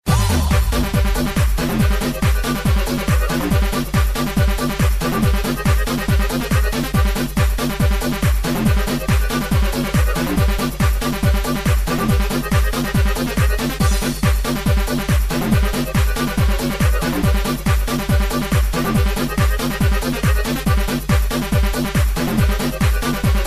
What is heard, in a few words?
Kolo Mix